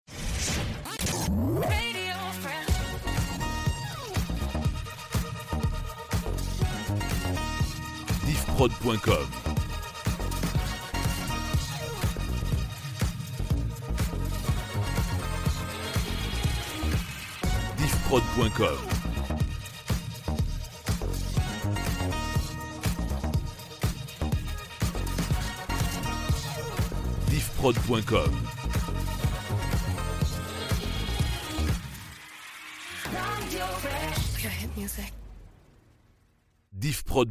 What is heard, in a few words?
Rampe HipHop 122bpm